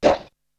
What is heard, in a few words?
Perc; ZERO G - Datafile DISC 2